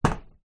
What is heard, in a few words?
footstep single metal; foley; zapsplat